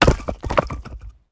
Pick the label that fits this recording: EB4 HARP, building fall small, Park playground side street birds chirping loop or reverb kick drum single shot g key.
building fall small